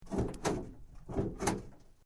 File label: Metal Sheet Move; Materials